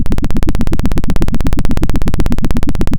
pop, Sound of a helicopter

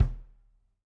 room Kick, drum

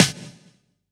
Elec Snr, drum

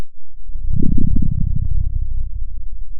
Sound of a dinosaur

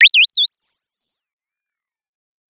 Sound of a bird tweeting nicely

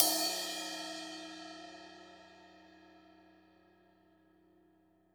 Trash, drum

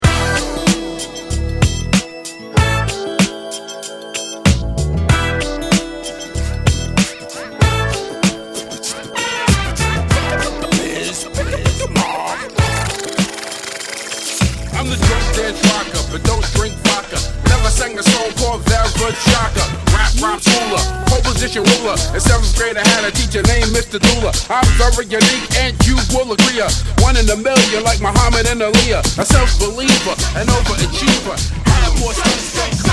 DJ Mix Rap

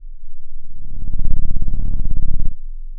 pop, Sound of a cat purring

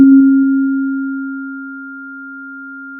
Sound of a church bell

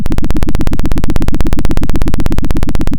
Sound of a helicopter

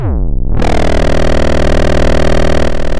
Sound of a chainsaw

